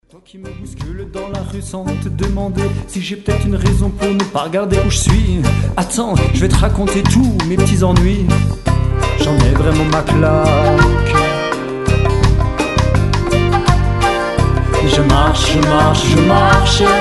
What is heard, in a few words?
Trottoir reggae